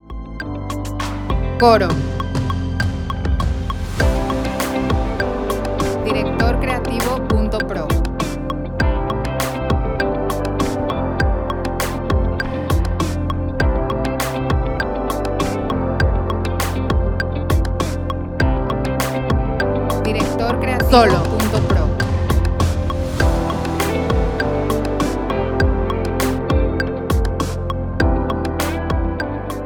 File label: Lead C 100 4 4